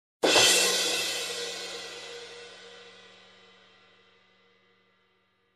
Two drum crash hits

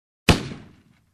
Single gun shot sound

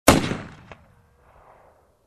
Revolver shot

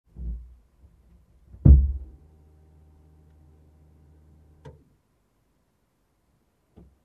Dull rumble from trunk

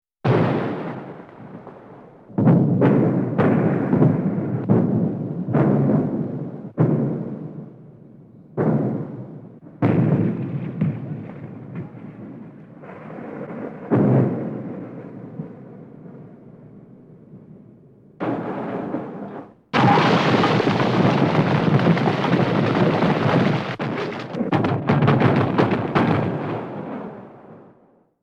Urban Area Multiple Explosions

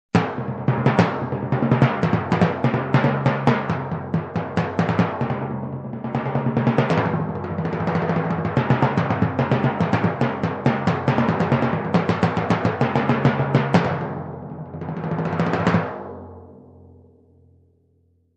Fast timpani groove

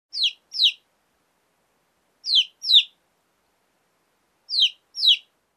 Hatchling chirping

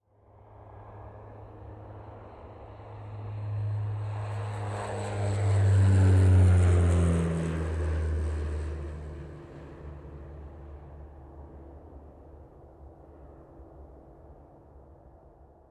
Light Aircraft Soaring Whirring Sound

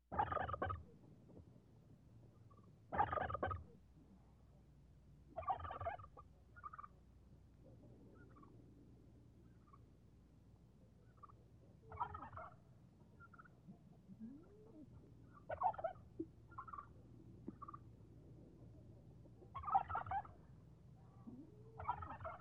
Fowl clucking

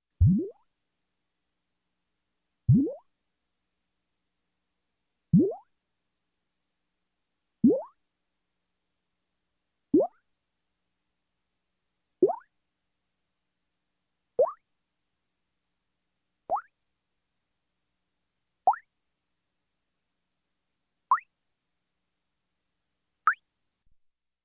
Synthetic Water Drops

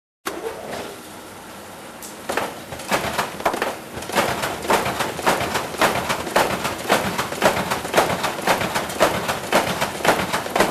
Industrial Grinding Machine running

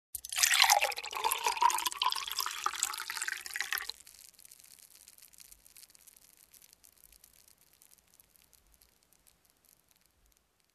Pouring soda in a glass

